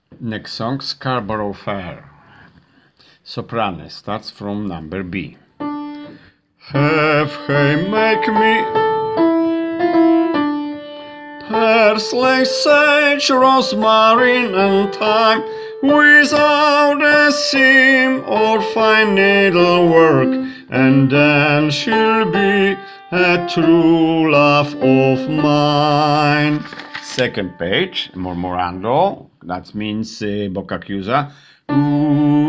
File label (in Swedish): sopran